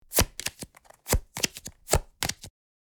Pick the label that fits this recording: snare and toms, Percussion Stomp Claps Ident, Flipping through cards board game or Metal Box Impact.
Flipping through cards board game